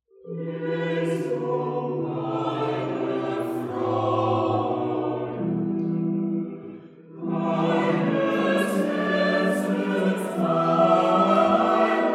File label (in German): Jesu meine Freude Choral